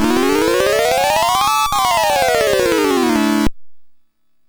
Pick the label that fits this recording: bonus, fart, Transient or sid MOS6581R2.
sid MOS6581R2